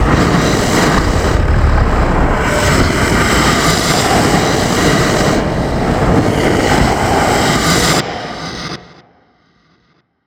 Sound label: Roar; Basileus